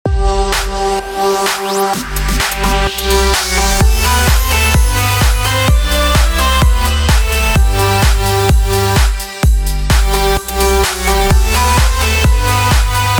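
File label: BED 12 DAYS OF CHRISTMAS G MAJOR 128BPM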